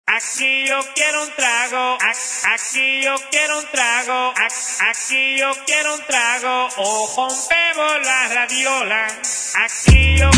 Acap intro

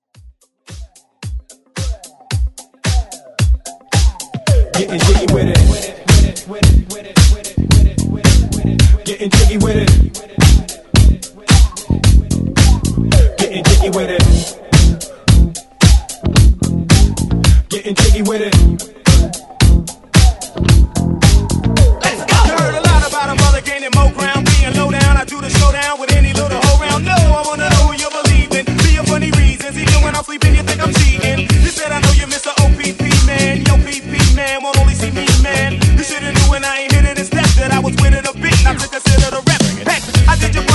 Funk Rework; Mash Up

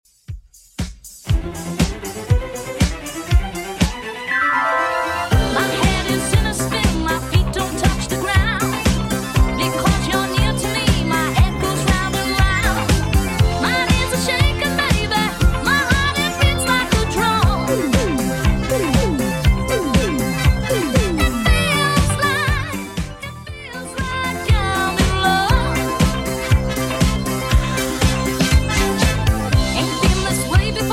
80s Redrum 119bpm

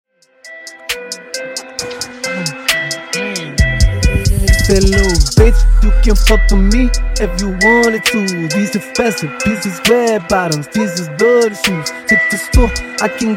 Funk Male Version 67 bpm

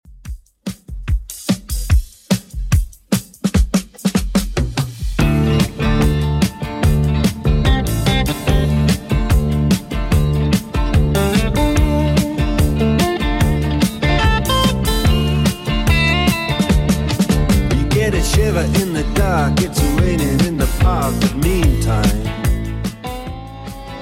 70s Rock 146 bpm; Redrum

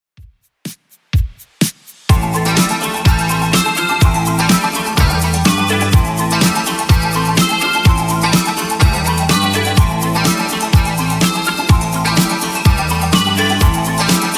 80s Replay Redrum 125bpm

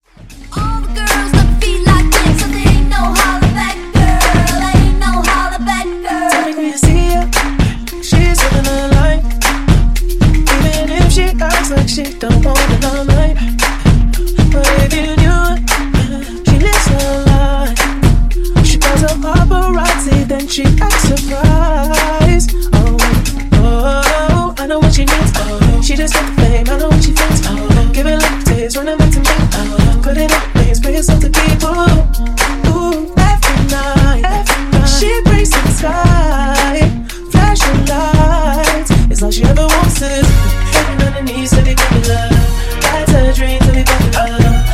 Funk MashUp 115 Bpm

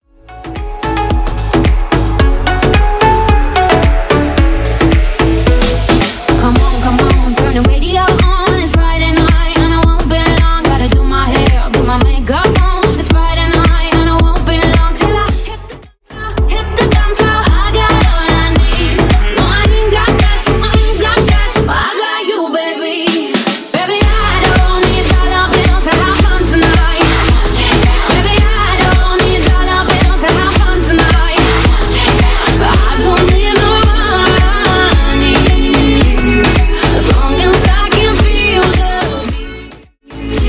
Re Drum 110bpm

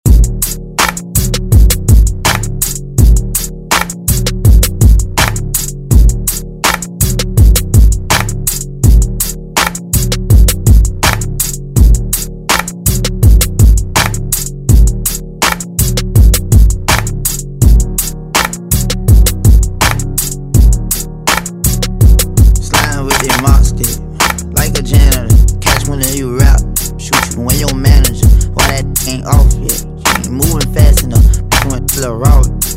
Intro Outro; Redrum

